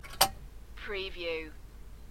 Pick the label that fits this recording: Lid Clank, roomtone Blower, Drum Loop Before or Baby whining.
Lid Clank